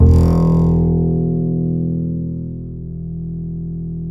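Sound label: double bass E; Univers Sons; Jazzistic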